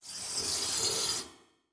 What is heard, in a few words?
Production Element Title Transition Liquid